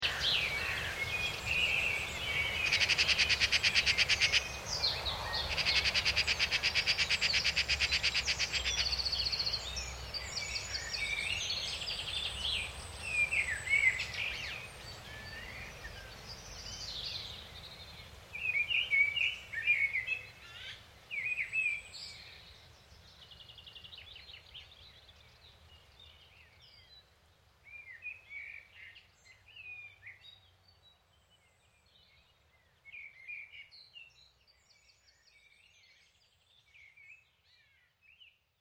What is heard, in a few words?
park birds distant traffic